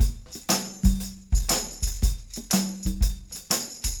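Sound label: 120 beat tam con; Big Fish Audio - DOM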